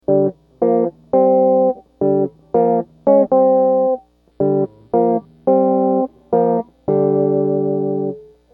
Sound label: smoke